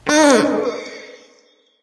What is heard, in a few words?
grunt lift light; smite; voicepack; hel